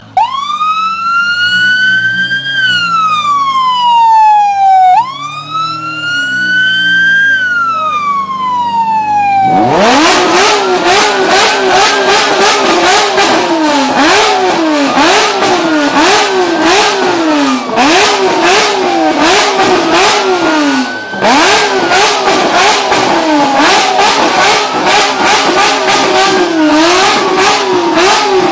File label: loud lambo, engine car sounds